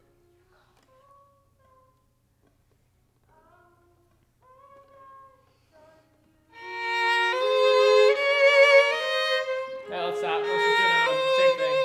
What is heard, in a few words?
violin